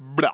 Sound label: bloop